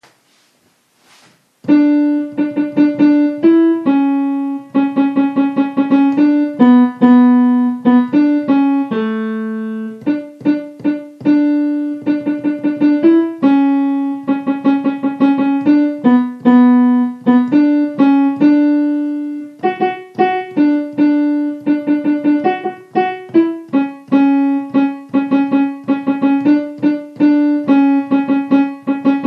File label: piano